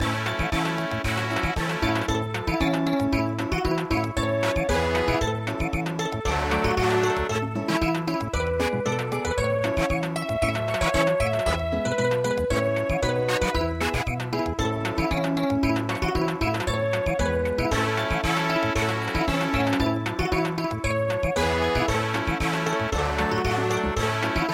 the rising sun Samba

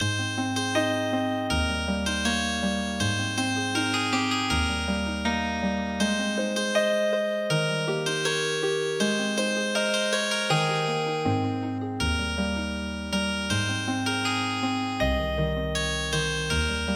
Wiegenlied Healing Harp, midi traditional

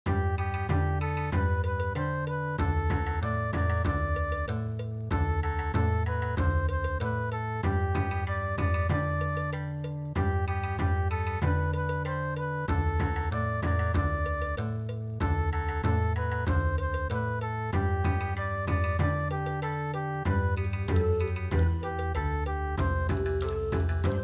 Bon Odori

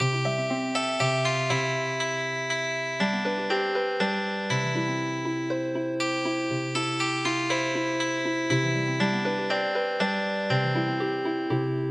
Old Carousel Healing Harp

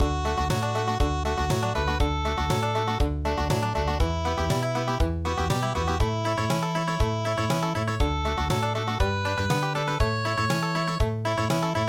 vittina crozza country

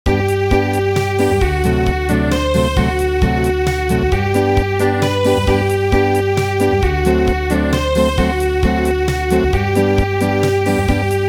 Acoustic, traditional

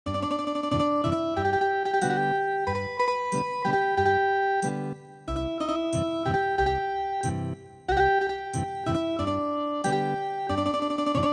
Ethnic Guitar

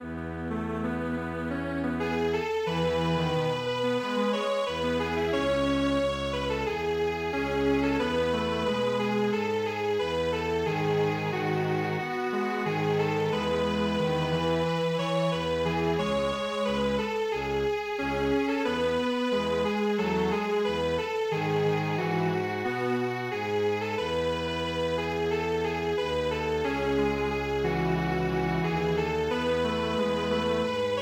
Strings Quartet, traditional; midi

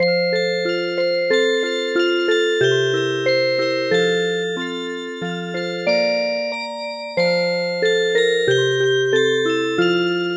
Music Box

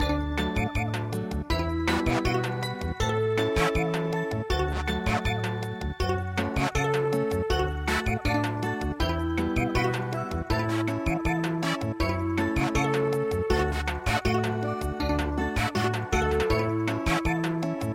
Samba, midi

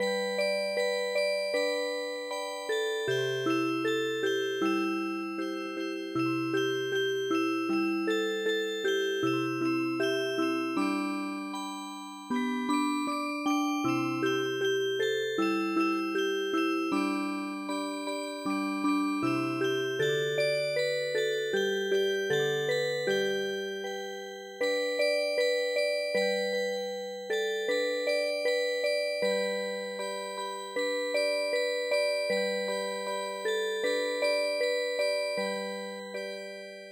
Music Box, midi traditional

